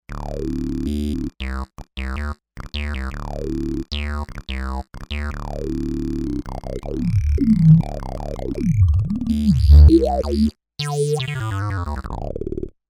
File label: Pwr Reso, Kawai K5000S factory presets